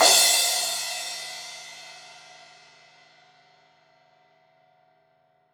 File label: Crash 16in edge, Cymbals; Deeply Sampled Drums